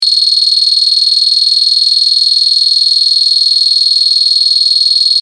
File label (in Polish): Ton